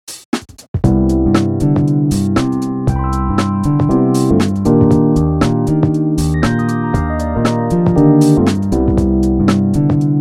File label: muzak; weeklybeats-2020